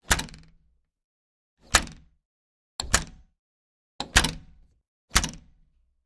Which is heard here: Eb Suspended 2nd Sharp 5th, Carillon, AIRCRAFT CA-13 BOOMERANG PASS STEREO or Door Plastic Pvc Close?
Door Plastic Pvc Close